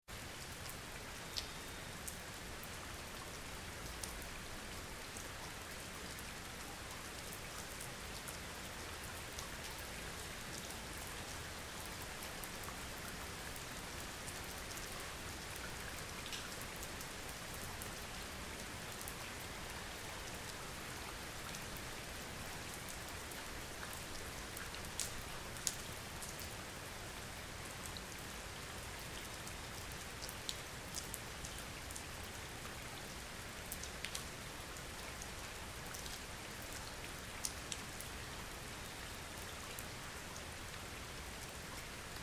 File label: Medium Rain Onto Trees And Leaves; Some close up raindrops on ground. Varying rainfall intensities; Environmental Sound Effects